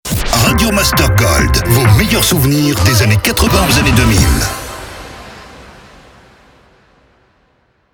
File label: Jingle SPEED; Radio Master GOLD